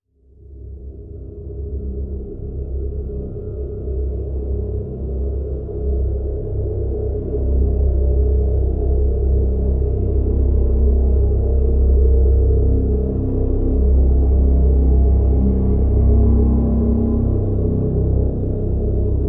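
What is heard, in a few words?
Drone Gauntlet C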